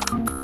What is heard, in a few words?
ar2 reload rotate; Garry's Mod; hl2; weapons